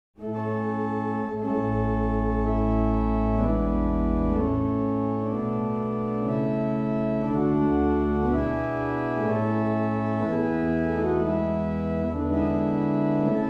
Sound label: Islington, barrel organs; Meldreth